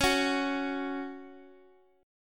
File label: Gb 5th Db, guitar